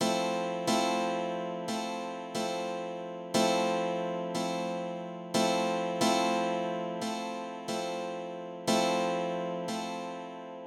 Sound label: Bossa nova rhythm